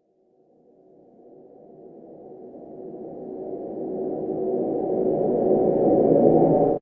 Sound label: Ambient Start; Game rip; MiSide